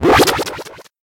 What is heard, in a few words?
grow